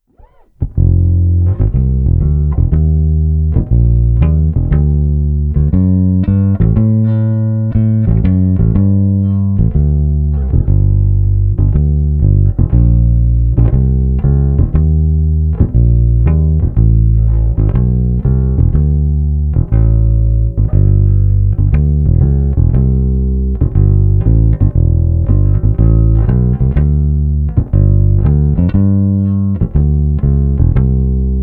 bass line

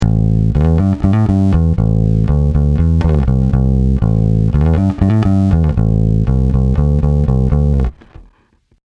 cs3 fuzz, compressor; boss cs-3

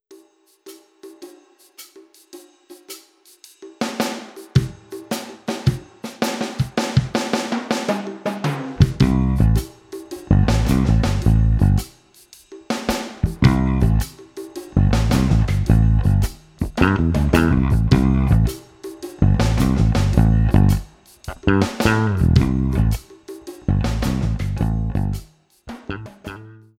King Koa Passive Nord Split Coils; nordys

